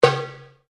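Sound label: Drum Darabuka Slap forte, Egyptian Darabuka Drum; Commanly available Egyptian Darabuka Drum; Drums; Sound Effects:Musical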